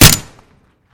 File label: pistol silenced fire; swb; attachments; silencer